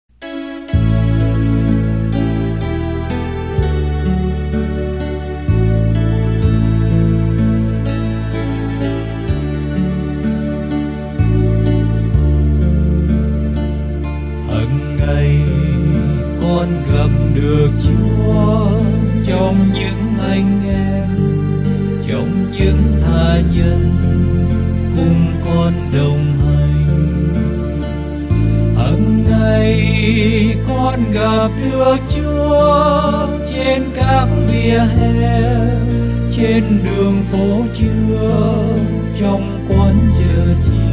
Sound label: BASS